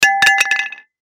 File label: Baseball Metal Bat Drop On Cement; SoundEffects-Blastwave; Sports